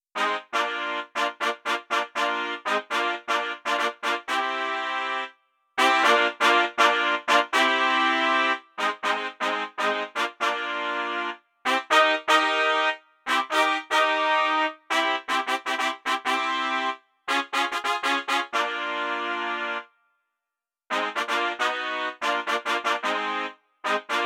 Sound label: Police Show instrumental